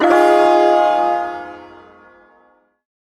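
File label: Shawzin Minor Chord; Min Chord F; Warframe; Duviri Ersatz Shawzin; Chords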